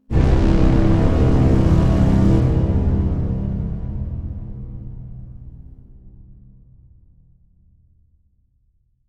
Strings Overture, Orchestral strings overture in C.; Musical